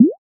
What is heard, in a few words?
select click